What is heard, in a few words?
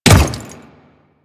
Default Step, Footsteps; Warframe; Grineer Royal Guard